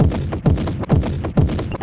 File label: Tech loop